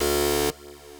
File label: trance lead